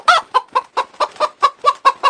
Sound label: chicken